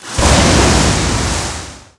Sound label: Enemy Shellwizard Gimmick Summon